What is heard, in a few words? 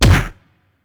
Enemy Kick; helltaker